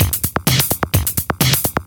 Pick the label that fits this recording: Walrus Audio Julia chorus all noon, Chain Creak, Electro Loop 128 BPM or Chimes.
Electro Loop 128 BPM